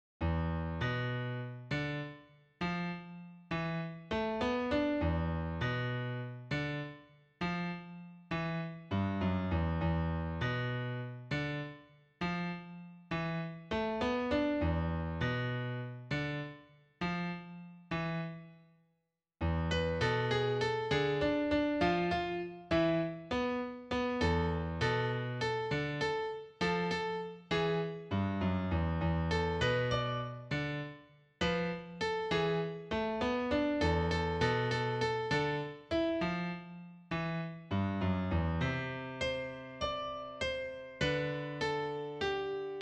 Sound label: piano